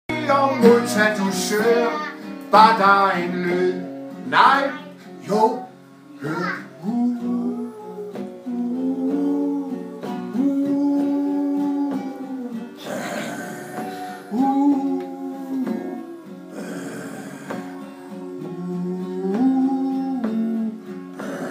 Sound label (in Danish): Zombie Sang